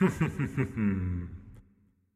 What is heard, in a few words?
Laugh